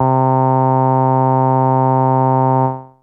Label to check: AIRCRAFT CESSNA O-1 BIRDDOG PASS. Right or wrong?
Wrong